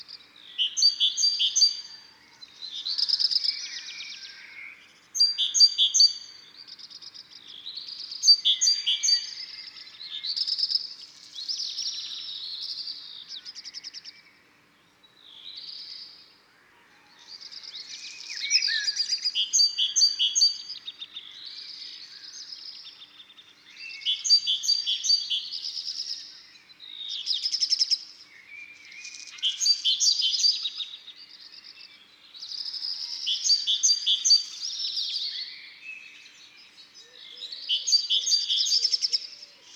Mesange charbonniere; Isere; Concerts naturels de l'Isere; Studio Les Trois Becs

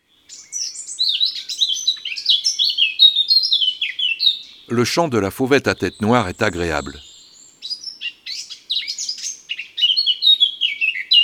Oiseaux des jardins Fauvette à tête noire

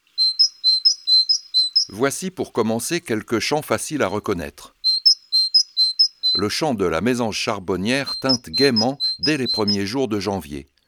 Mesange charboniere, Oiseaux des jardins

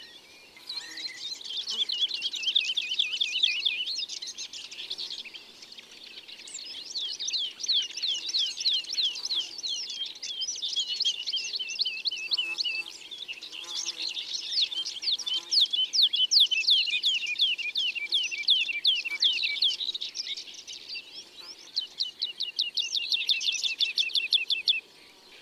Alouette lulu; ABC des chants d oiseaux

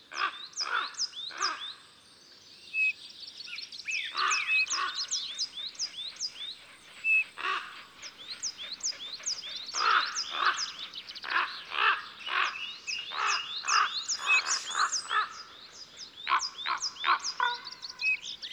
Grand corbeau ABC des chants d'oiseaux

